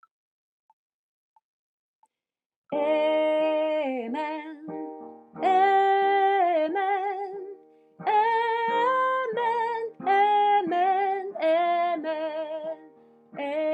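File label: Amen Let it shine - Soprano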